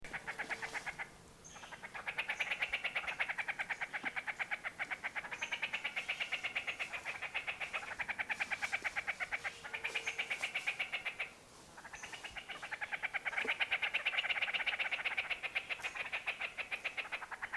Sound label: Elegant Trogon Alarm Call